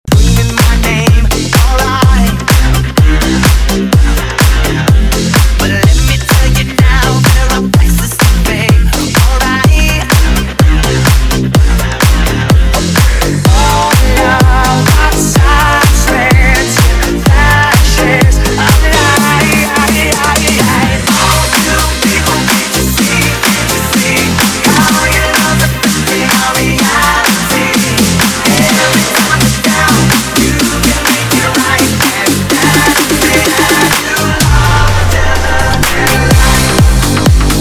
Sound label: Club Party Banger